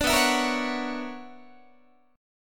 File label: B Minor Major 11th, BmM11 chord, Guitar; strummed; JGuitar